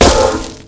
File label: bush; Mafie 1